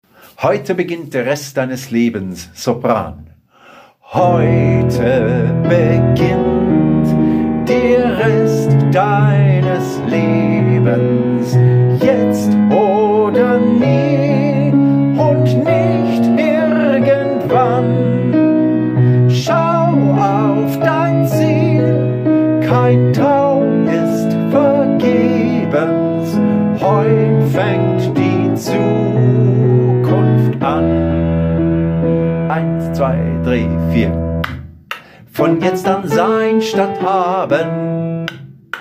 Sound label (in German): Sopran